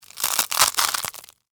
Crunch Ramen Noodles; Texture; Crunch Sound Effects; Objects; Blast Wave

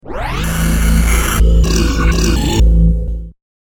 Power Up Frankenstein, monster; Monster Sound Effects; horror sound effect

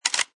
Machine Gun Foley Chamber Closed; Machine gun, type 2: Chamber closed; Gun Handling

